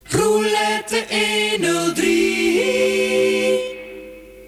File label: silence